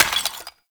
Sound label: Vase Clay Tiny; Objects; Vases